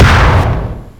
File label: Rivet Hit Craft